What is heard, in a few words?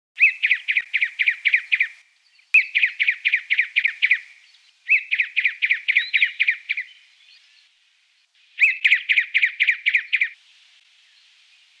hooded Robin; robins; canberra garden birds